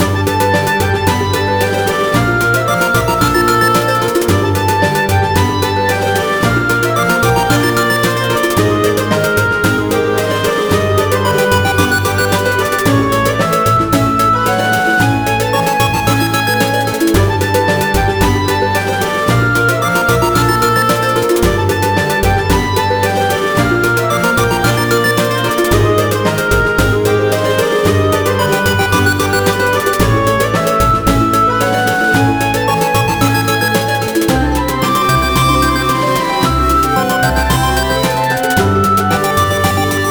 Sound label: bgm town sea